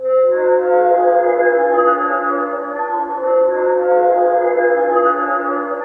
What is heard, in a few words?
WATER C3, Synth City; WATERFONE